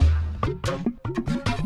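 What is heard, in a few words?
Music Dpad Base 145; from dust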